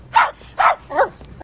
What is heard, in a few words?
little dog barking